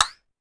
Woodblock Up More, Pitch Env Various, Clavia DDrum; Clavia DDrum-AT